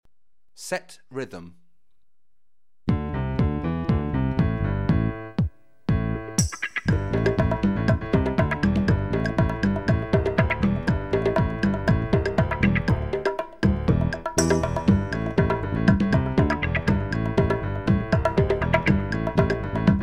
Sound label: Set Rhythm